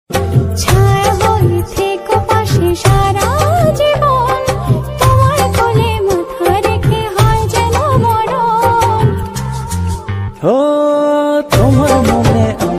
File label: only music tone bengali very sad emotional ringtone bangla sad